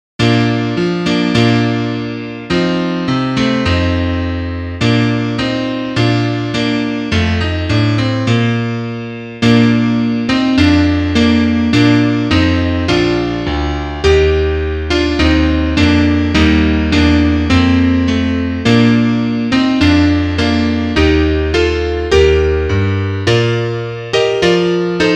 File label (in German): Klavier Solo, trompete; Über Stock und Stein